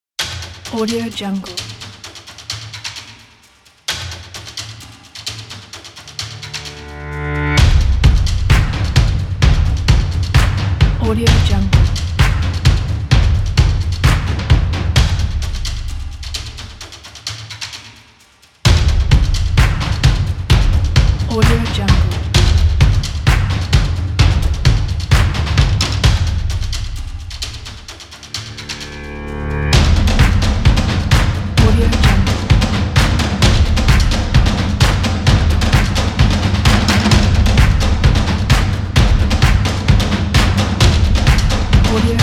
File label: percussive energetic upbeat action sport